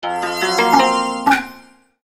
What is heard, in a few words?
Japanese instant message; Nokia; audio themes